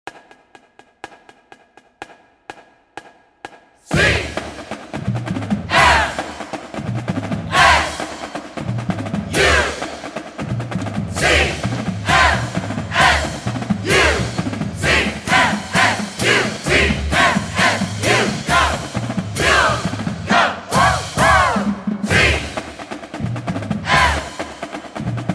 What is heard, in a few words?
drumyell, percussion